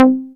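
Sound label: Moog Rouge, Bass; Best Service Gigapack 2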